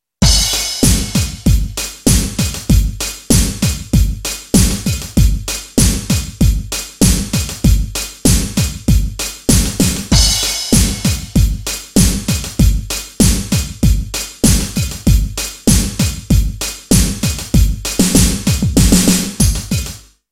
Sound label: Ghost Snare